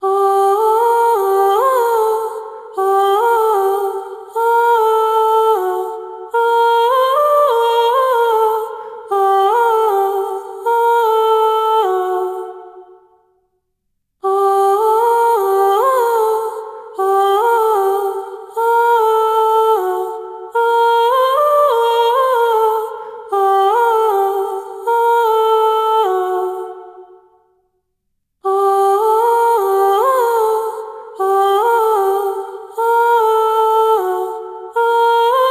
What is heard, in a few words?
Vocal